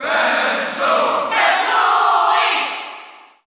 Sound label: Cheer German; Banjo & Kazooie; SSBU